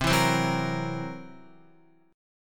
C 7th Flat 5th C down Guitar; C7b5 strummed; JGuitar

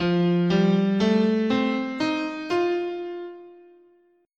F Dominant Pentatonic, scale

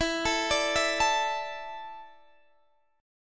E 7th Suspended 4th, Guitar, Standard; x,19,19,19,17,17; E7sus4 arpeggiated; capo=3

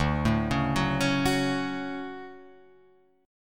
D Major 7th, Guitar, Open F; DM7 arpeggiated

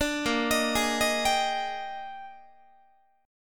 Bb 13th Bb Guitar, chord; arpeggiated

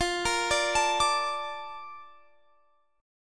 A sharp Major 7th F

